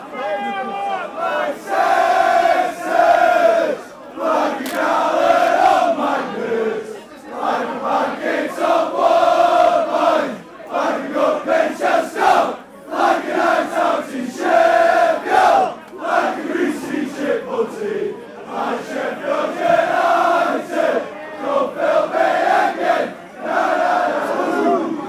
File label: greasy chip butty, sheffield utd chants, football songs